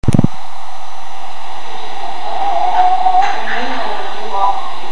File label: baby moaning, A Baby Moaning